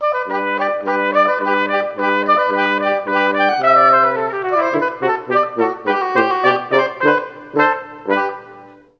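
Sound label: Trp Ob Hn, trumpet oboe horn; Juilliard Music Adventure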